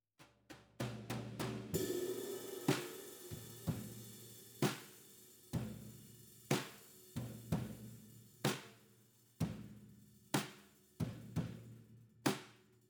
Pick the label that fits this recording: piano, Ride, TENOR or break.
Ride